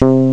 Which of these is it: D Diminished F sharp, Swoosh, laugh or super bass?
super bass